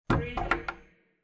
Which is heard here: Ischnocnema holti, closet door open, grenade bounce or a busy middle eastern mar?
closet door open